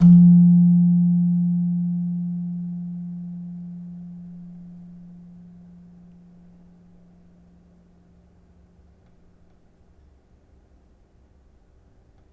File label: Slentem, gamelan